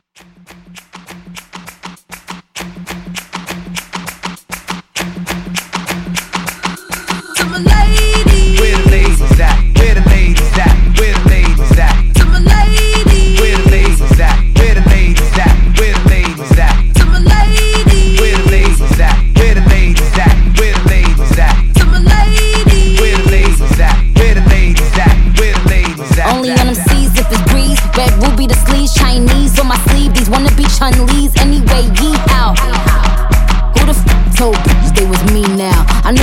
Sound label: Hype